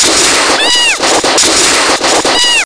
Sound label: melee